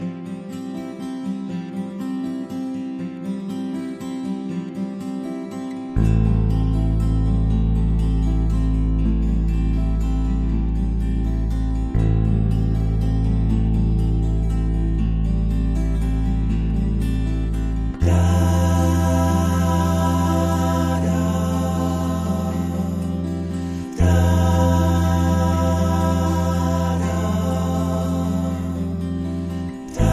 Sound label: The Land And The Sea, Alt Choral